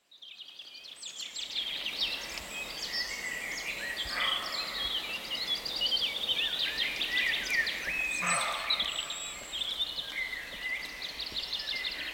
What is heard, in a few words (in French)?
chevrette